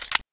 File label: magin, weapons, colt; garrysmod